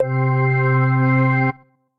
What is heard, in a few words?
Organ, Tonewheel Organ; Electric Keyboards; Organ Italian Highways; Ableton; Factory Packs